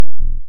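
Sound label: waveform FM triangle triangle envelope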